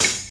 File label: 60s thin open hat a key, Royality free open high-hat sample tuned to the A note.